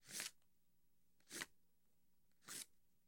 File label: Cards Slide From Shoe